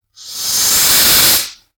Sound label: snake hiss